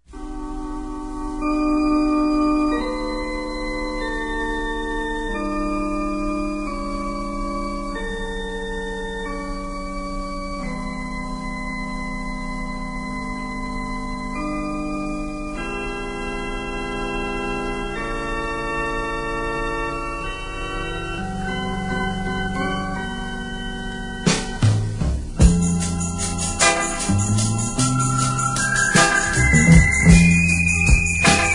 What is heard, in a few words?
Whistle; Free Sound